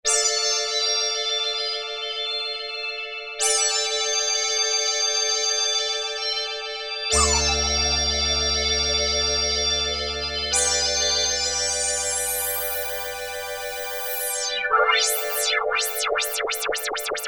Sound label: Phased LFOs, Korg PolySix; Tweaks